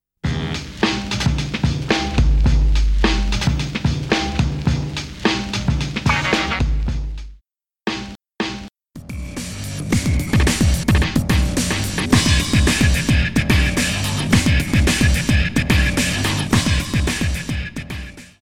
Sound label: Snare